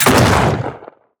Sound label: Shotgun Shot; Weapons